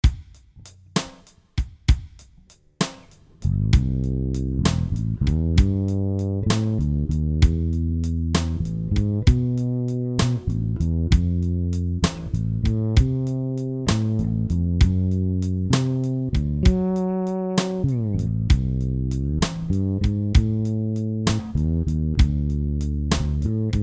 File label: BLUES